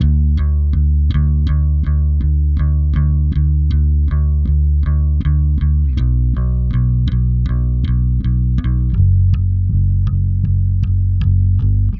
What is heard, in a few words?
D Bass Guitar; Guitar Hypnosis